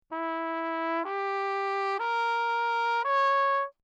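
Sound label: trumpet mute Bach ohne; Perinétventiltrompete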